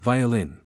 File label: violin